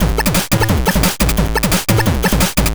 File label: Chip Shop 175 Combo A; 175 BPM; CHIPSHOP DELUXE; CHIPSHOP LOOPS